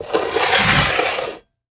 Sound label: Skateboard Slide Down Metal Rail; Movements; Sports; Adobe; TTRPG